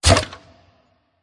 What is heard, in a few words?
Gain Wood; the great ottomans strategy battle for throne android